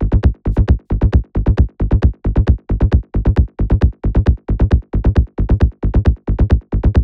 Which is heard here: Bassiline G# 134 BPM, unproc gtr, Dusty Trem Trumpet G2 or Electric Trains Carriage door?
Bassiline G# 134 BPM